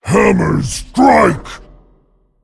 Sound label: Male Dragon Attack